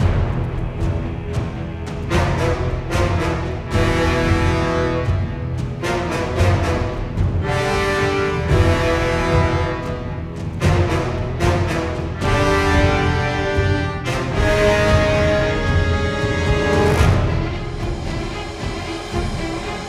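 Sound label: background music battle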